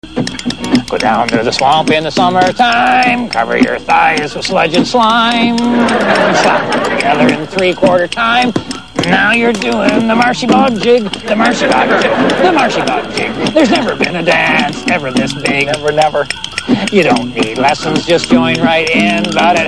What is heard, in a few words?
jig; RedGreen; CampFireSongs